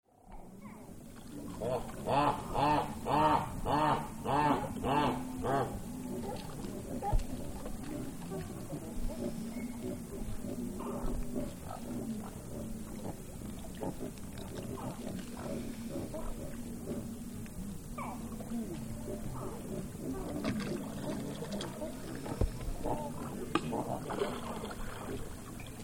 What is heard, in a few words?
Wetland Centre Various Water Foul, Atmosphere - Birds In And Ou; Wetland Centre Various Water Foul Atmosphere - Birds In And Out Of Water Etc; Bird Sound Effects